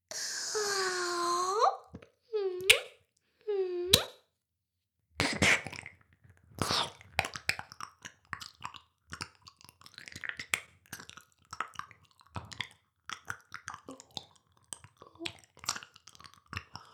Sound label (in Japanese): 耳舐め音声, ASMR; 魔法少女マスカリマッスル